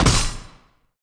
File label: sword